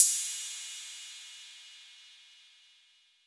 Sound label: ride sample b key